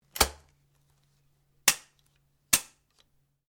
SHOTGUN BAIKAL 12 GAUGE DOUBLE BARREL DRY FIRE SEQUENCE STEREO; A selection of domesttic firearms; Guns